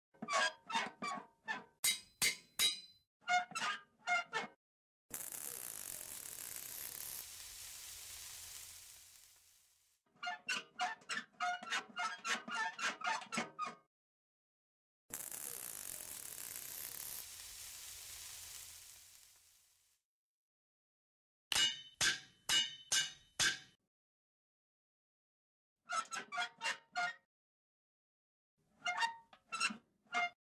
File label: tin can club crafting; Scrap Weapons; Zomboid